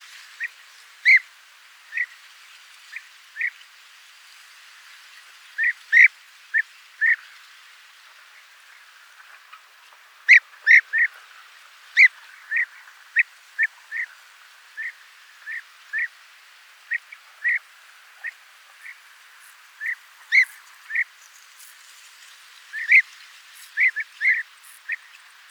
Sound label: call, bee-eater, There are one or two family groups with adult and young birds.; The flock probably does a brief stop-over during migration.; merops apiaster